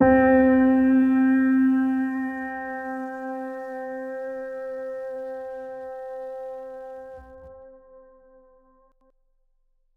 Piano C, Lo-Fi; HipHop; Melody Kits; 84 C#m; One Shot; Ghosthack; AC2024 - Day 5; Kit Glow

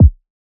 Kick, G; Drum One Shots; Kicks; Cymatics; Eternity Sample Pack

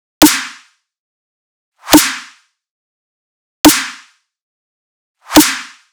Riddim Drum Loop Snare (140); Ghosthack; Riddim Essentials 2020

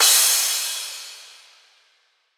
Crash; Cymbals; Ghosthack; Color Bass Essentials

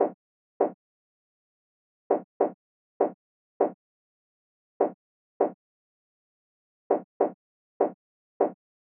Drum Loop 100 BPM Percussion, Hip Hop; Drum Loops; Loop Stems; Cymatics; No Se Nada

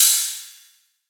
Ride; One-Shots; Drums; Cymbals; Ghosthack; Color Bass Essentials

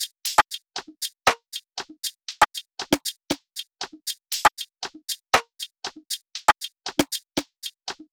Percussion Loop 118 BPM; Novel; Hip Hop

